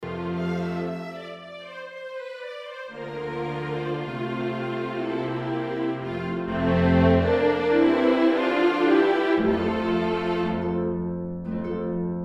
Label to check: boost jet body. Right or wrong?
Wrong